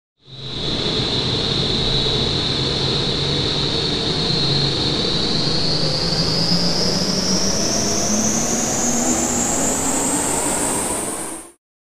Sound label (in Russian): dvigateli kosmicheskogo korablja